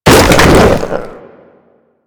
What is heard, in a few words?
Infested Jetpack Charge Lift; Warframe